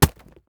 Generic Object Drop Fall Impact

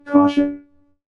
Caution; free game sound effects library

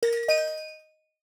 Rustic Bells

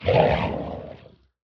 Monster Beast Creature

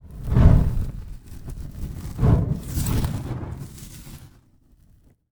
Fire Blow Torch

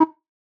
Beep

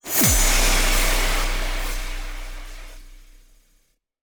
Designed Infernal burst Spells

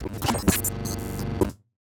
Digital Glitch Malfunction

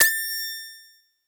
Futuristic Alienatic

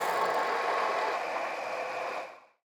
Wheels Smooth Robot

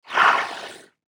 8 bit Monster Slithering

